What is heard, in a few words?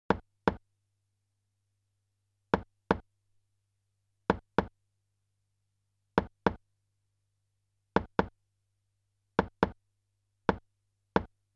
Auction, string quartet; monopoly; string quartet compositions